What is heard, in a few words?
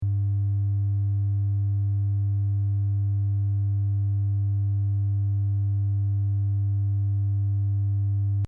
EQ up, 100Hz